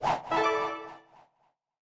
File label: total Win Update; east sea dragon king